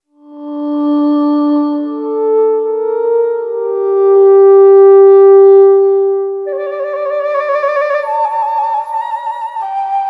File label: midi guitar instruments; eastwest midi guitar instruments test